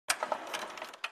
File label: slide